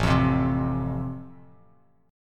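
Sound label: A Minor Sharp 5th, Bass Standard, chord strum; Am#5 strummed; 5,8,10,5; JGuitar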